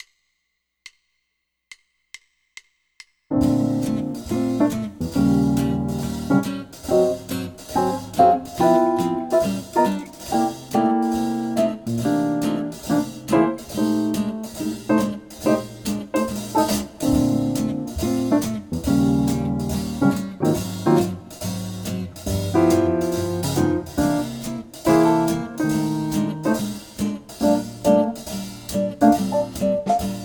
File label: Jazz BASS Play Along SWING